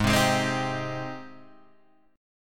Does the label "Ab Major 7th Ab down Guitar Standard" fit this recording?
Yes